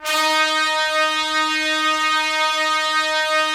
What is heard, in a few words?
Trumpets; Roland - LA Composer vol. 4